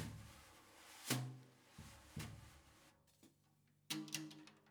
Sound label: Ride